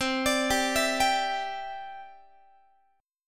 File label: C-Major Add 9th, Guitar; Cadd9 arpeggiated